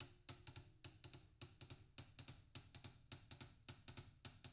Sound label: HIHAT LOOPS, Living Drums